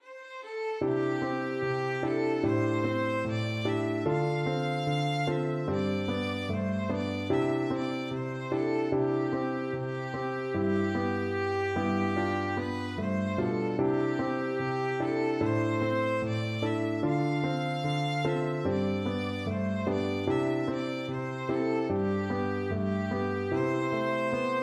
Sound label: violin